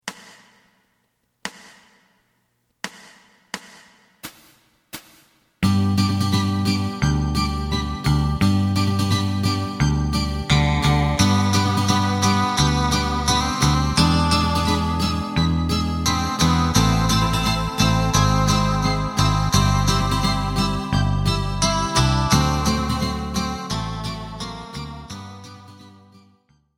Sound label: bass